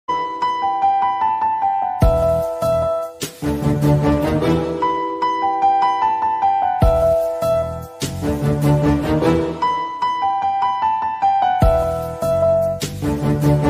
instrumental; thiru movie

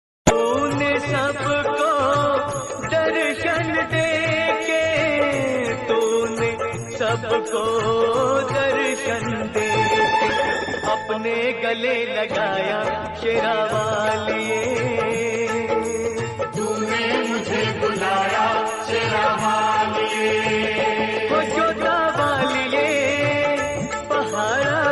bhakti song ringtone durga puja; durga puja special

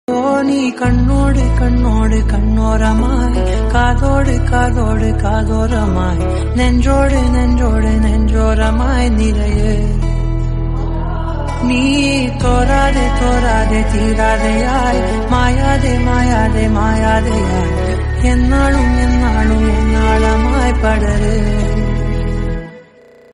ringtone tamil ringtones telugu ringtones

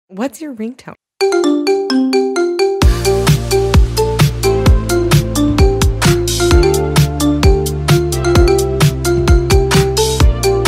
ringtone marimba remix

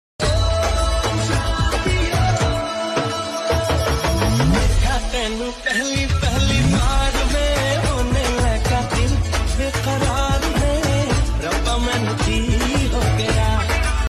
ringtone hindi ringtone

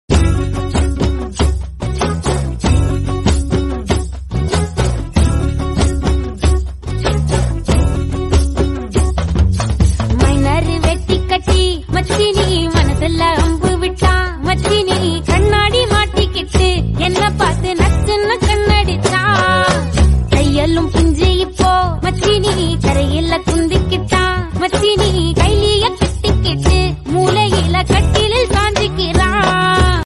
instrumental ringtone romantic ringtone

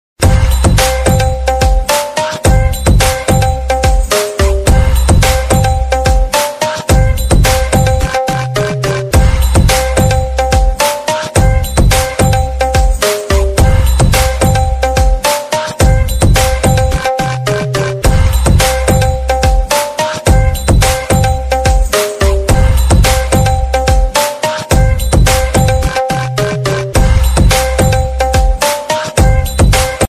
bgm climax horror movies bgm ringtones; bhool bhulaiyaa 3

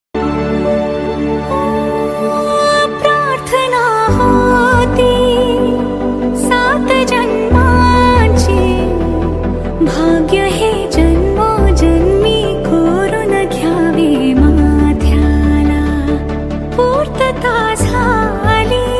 marathi song

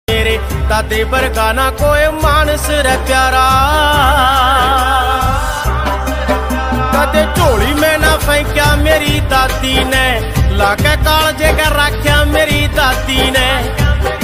haryanvi song ringtone

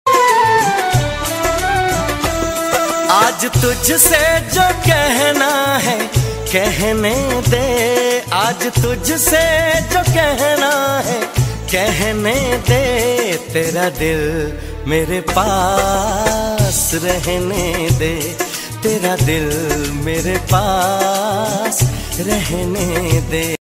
hindi song ringtone love song ringtone

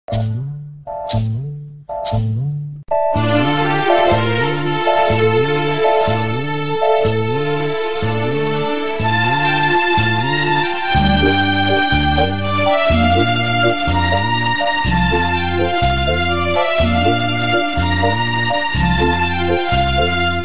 nature video bgm early morning bgm ringtone

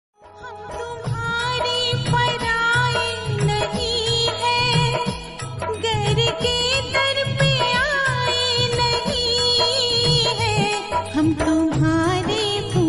radha krishna ringtone dharmik ringtone bhajan; new dharmik

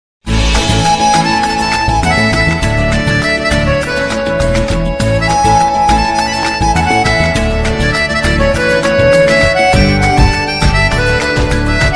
phone ringtone, indian drama serial ringtone; kundli bhagya

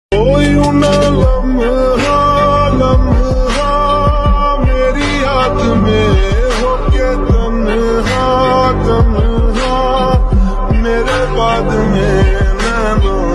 sad broken love status, slowed and lofi

